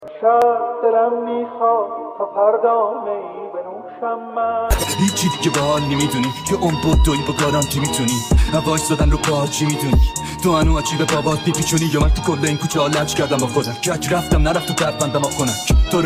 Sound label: dream rap